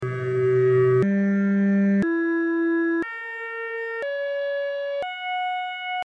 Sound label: microtonal variations of the all interval chord akkord c g e b cis fis; akkord 10 c-2 g1 e1 b- cis1 fis-1 sukzessiv